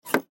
Pinball Plunger, Pinball Plunger Pull Back Spring Squeak; Arcades; Sports; Blastwave